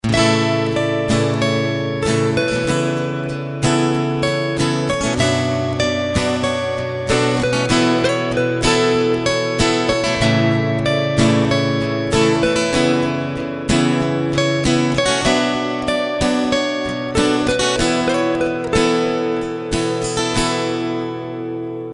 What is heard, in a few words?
tone